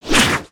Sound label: Massive Spear Swing